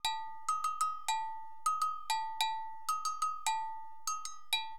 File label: AGOGO, Perc; LiveAct Pool; AcidJazz Funk